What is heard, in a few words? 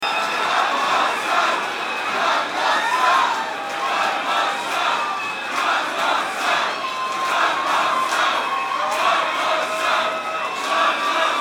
Crowd Chanting